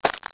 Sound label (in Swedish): skog; skogsljud; aomdc